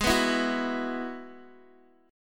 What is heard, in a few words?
Ab Minor 7th Sharp 5th Ab, Guitar Open D